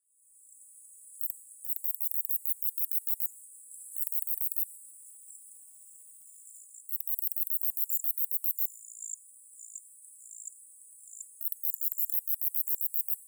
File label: kobane sasakiri